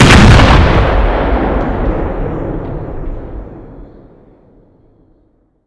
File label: hit